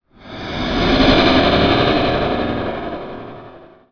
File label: Warrior Breath Active; Playable Tutorial Level; TR2